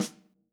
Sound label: Yamaha Holz L hand